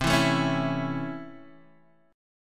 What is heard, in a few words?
C sharp Minor Major 7th Sharp 5th C